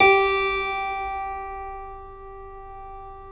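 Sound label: G3 clavinet right, Keyboards Acoustic; USB Soundscan vol.17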